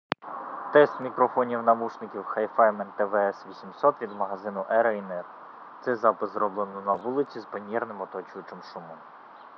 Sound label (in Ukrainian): на вулиці; HIFIMAN TWS800